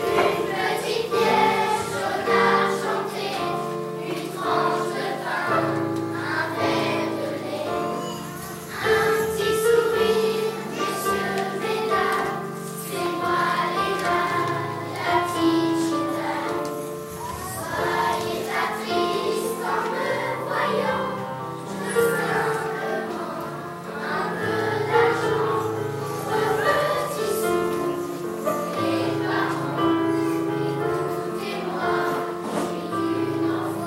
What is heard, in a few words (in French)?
accordeon